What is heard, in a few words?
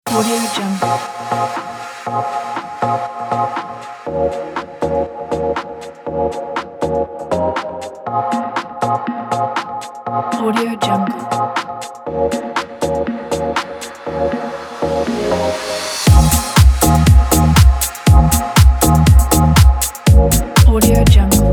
fashionable deep house; hopesound